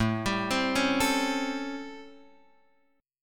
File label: A 7th Sharp 9th, Guitar, Open F; A7#9; arpeggiated; JGuitar